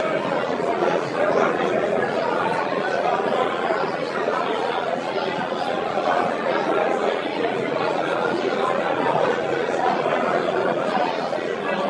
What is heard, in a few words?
crowd